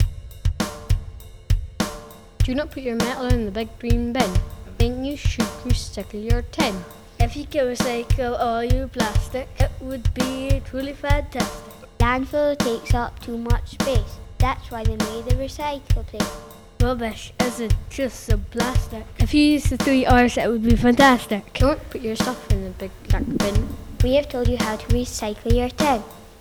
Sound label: rap